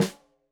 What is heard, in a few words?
Snare Stereo, Drums; Deeply Sampled Drums